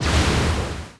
Water shell

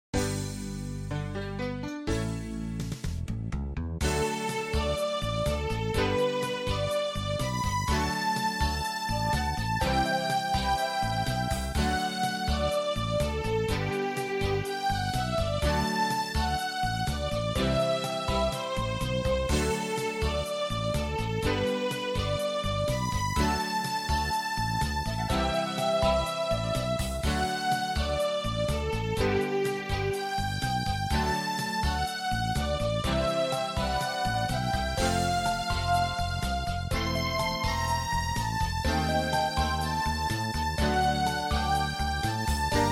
Midi Town; Shining Plume 2 Windows gamerip 2017

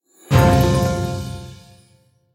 Game Win; escape from frankensteins tower

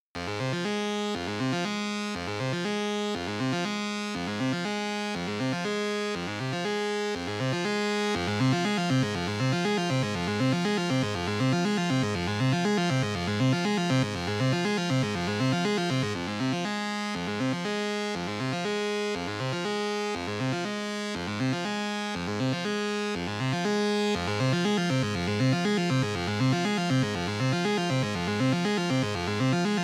Red Fog Analog Hard; Get Even

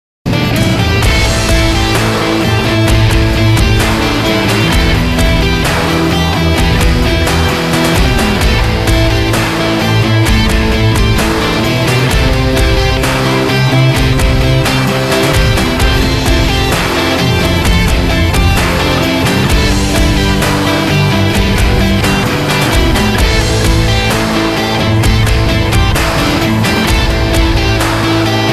Race Win; SkyDrift

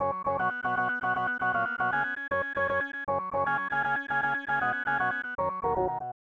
Stadium Organ; super bases loaded 2; snes

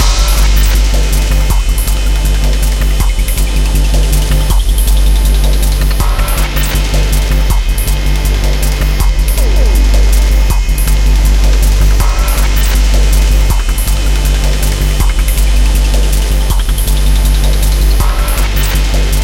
Chase; mirror's edge gamerip

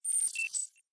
Data Calculating

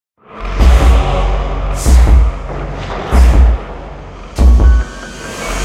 Boss floor intro

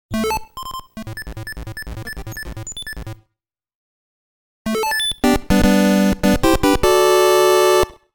LOCK ON ~ HELLO SE ~ FANFARE; HELLO SE (SUPPLY COMPLETE); Game Sound Museum Namcot; Star Luster

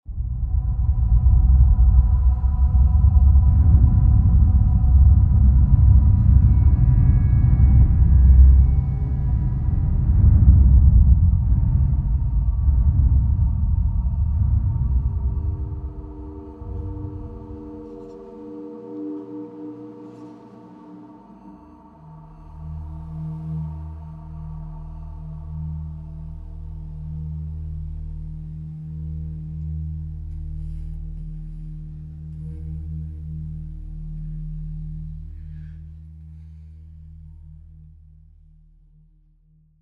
Dark Scary Explore Mood

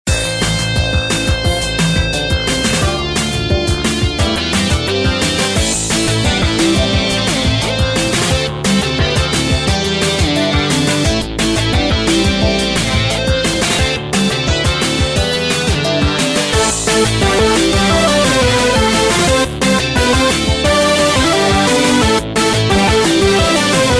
Music Main Dungeon

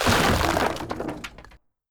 boards

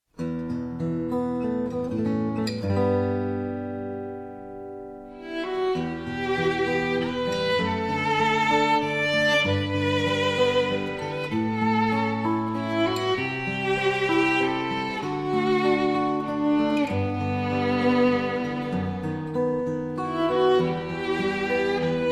sorrow; Ange Vierge Girls Battle

